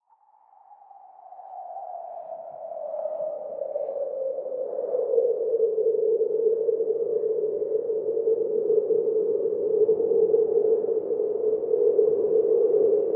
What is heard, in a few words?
swoosh